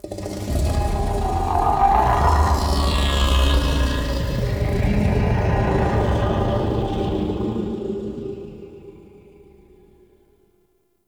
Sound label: Growly Vocal A; Ambience; Warframe; Man In The Wall